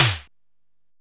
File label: drm D1, DRUMCOMPUTER, EMU SP 12; Best Service; ProSamples vol.55; Retro Sampler; EMU SP 12+1200